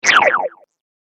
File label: Laser Sound Of The Future, science fiction sound effect